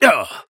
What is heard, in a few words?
pain big; Operative